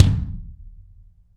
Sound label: BD AMBIENT, Drums; Real Mega Drums